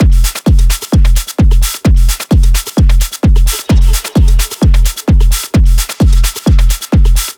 Drum Loop 130, Techno

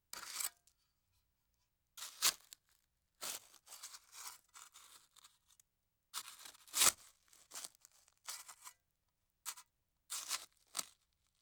Hand Shovel Movement; Fairlight Sound Lib

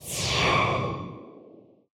Spaceship FX; Video Game; Cymatics; Video Game Sample Pack Vol 1

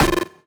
Retro FX; Cymatics; Video Game Sample Pack Vol 1

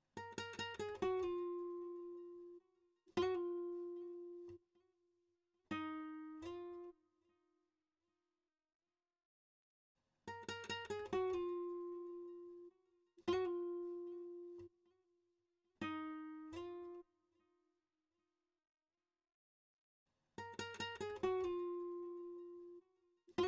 95 BPM D# Min Guitar Harmony; Melody Loops; Cymatics; Hip Hop Sample Pack; Fina

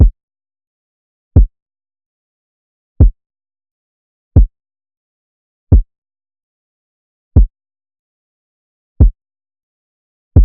Drum Loop - 80 BPM Kick, Lofi; Loop Stems; Autumn

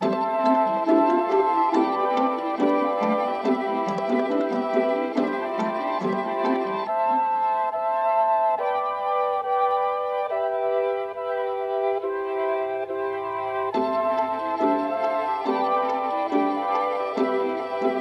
140BPM C Maj, nostalgic hip hop melodies; Green Fields

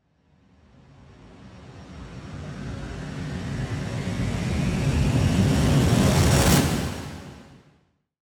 Riser

